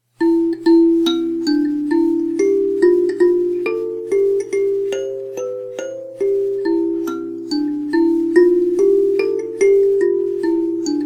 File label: classical kalimba